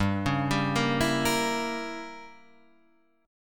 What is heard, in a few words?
G Diminished 7th; Gdim7 Chord {3 4 5 3 5 6} arpeggiated